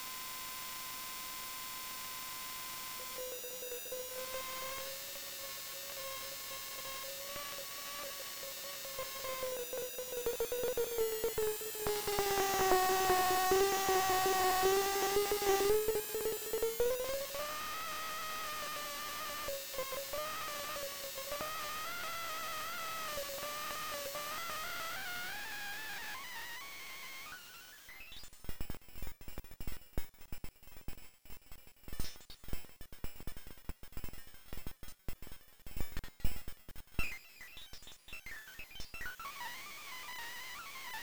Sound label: noise simplex am